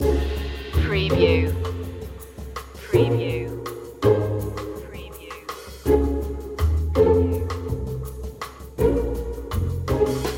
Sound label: entropy humor loop